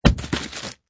Head roll on ground: Decapitation, head falls and rolls; Injuries; Combat